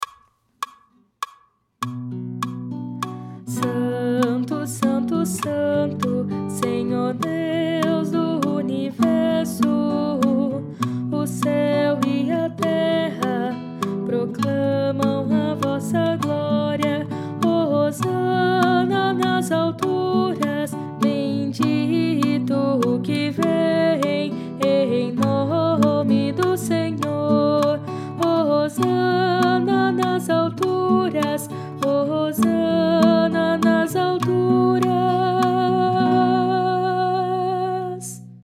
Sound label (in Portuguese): contralto; Santo